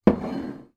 foley bottle wine down then slide across wood table